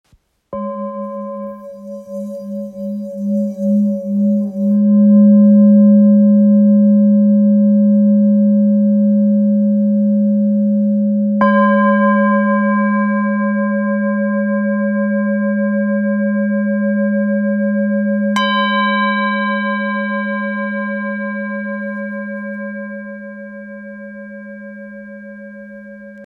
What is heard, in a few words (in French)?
Bol ancien sol